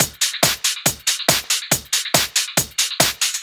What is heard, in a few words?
Addon Loop, Drum Loops 140bpm, Perc Loops